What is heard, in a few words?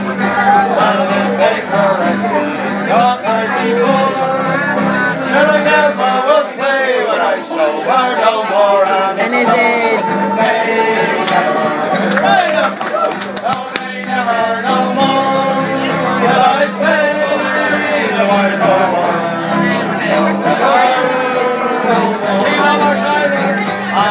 impromptu music in the pub